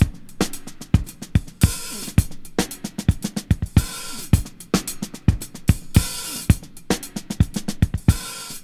111 bpm breakbeat sample f sharp key, kick tuned to the F# note